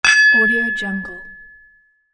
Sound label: glass clink